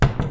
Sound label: Furniture